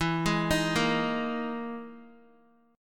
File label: E Major 7th Flat 5th, Guitar; EM7b5 arpeggiated; JGuitar